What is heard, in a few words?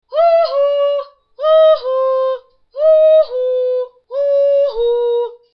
Huhu